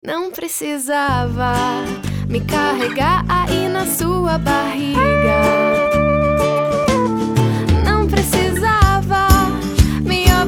Jingle Dia das Maes

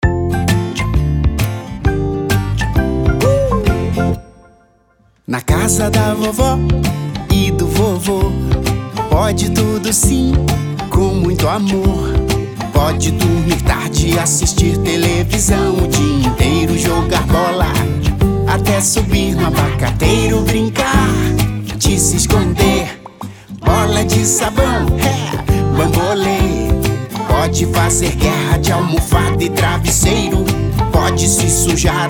Jingle sem locucao; Dia dos Avos

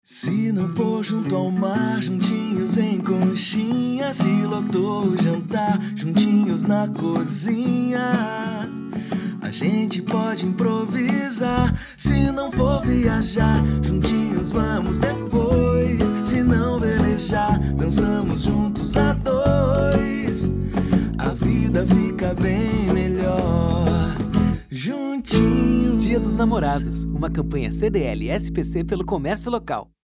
DIA DOS NAMORADOS JINGLE

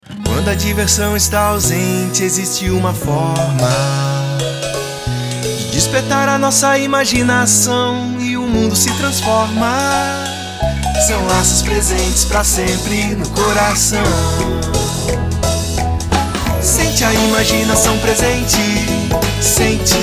Jingle Dia das Criancas; FCDL

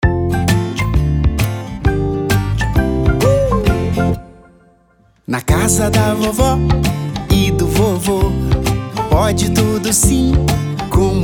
Jingle Dia dos Avós

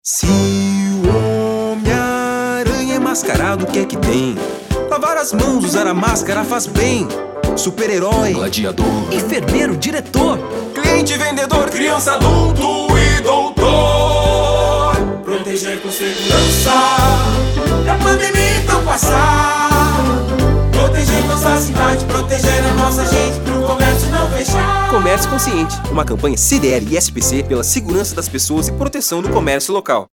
COMERCIO CONSCIENTE JINGLE

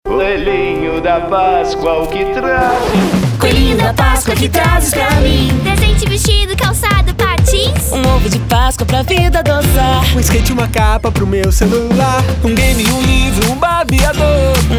Jingle Páscoa

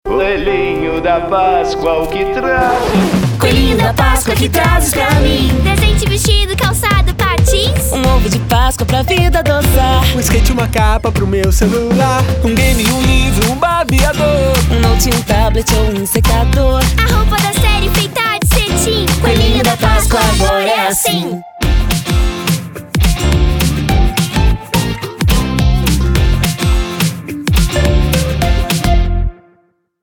Jingle Pascoa

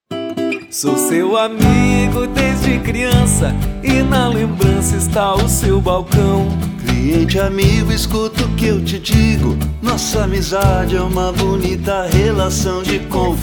Mes do Cliente Jingle Sem Assinatura

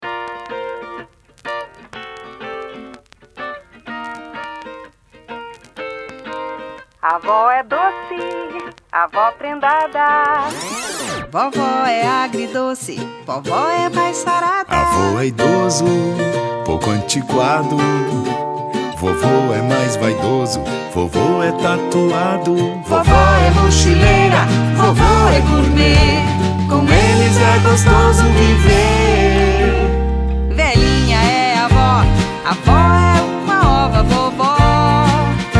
Jingle Dia dos Avos

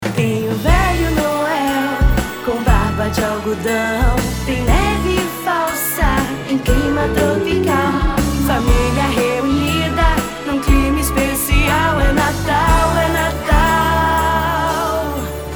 Jingle Natal

